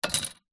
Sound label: office ruler plastic down on table